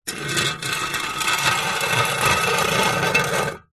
industrial shovel spade metal drag scrape concrete; zapsplat